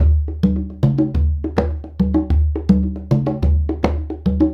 Congas; Essential Percussion

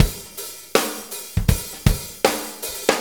Shuffle Loop, Full Kit Loops

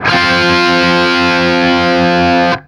5TH F, Guitar; East West; Steve Stevens Guitar Samples Collection

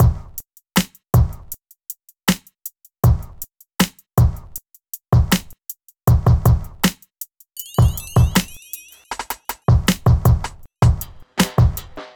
Drums, Hip Hop

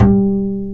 UPRIT BS G3, WOOD BASS

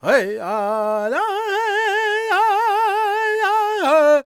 C# MIN, World Voices, LAKOTA; Spectrasonics Vocal Planet CD5

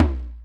JV KIT; Pro-Rec - Synthsonic; JV ANALOG2

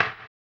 HAMBONE, Vocal Blues Soul; Spectrasonics; Planet

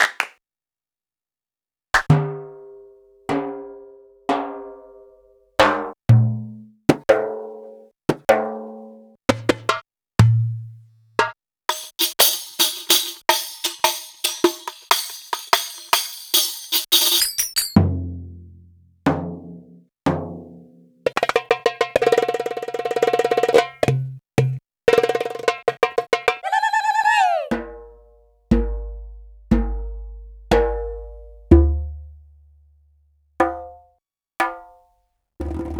Kurzweil K2500

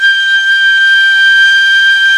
PICOLO1-G4, PIPE

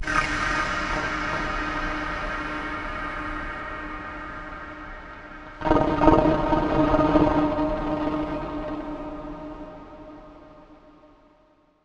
Dust Pipe D#; Zero-G Seismic Frequencies; 90 s Sample CDs

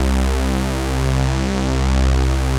VS strings C; Greytsounds; Scott Peers Sound Engineering

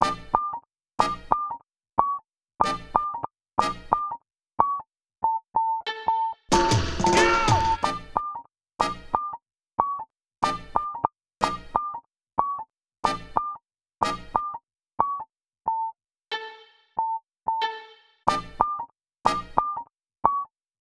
Melody

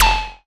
FX, SCI Prophet-5 synth

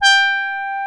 HO G5, ANA HRN

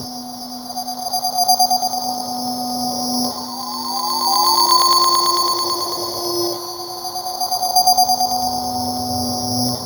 Suspended Tones Reversed; Sonic Foundry (Sony Creative Software); Loop Noir

